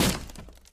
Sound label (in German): tuerramme; minecraft